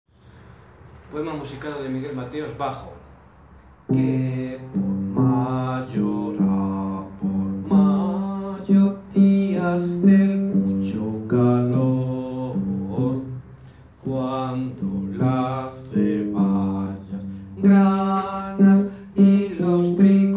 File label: bajo